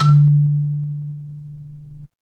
instrument kalimba

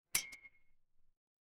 glass clink

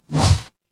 ui send

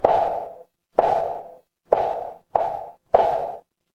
footsteps heels

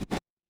transition record scratch

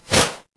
ui toast

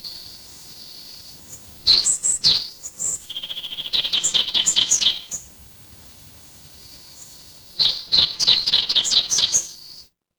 ambience forest

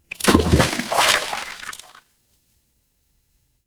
water splash

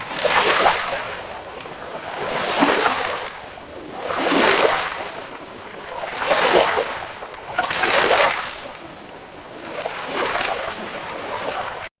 ambience ocean

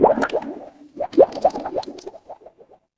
water bubble

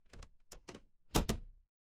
door close

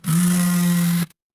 office phone vibrate

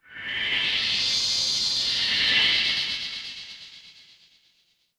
magic scifi alien

